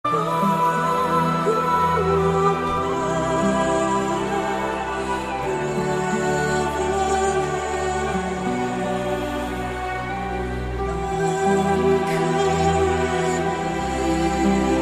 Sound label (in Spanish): epico relax